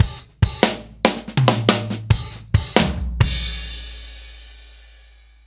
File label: Ballad Loop, Drum, 70s Ballad; Drum Tools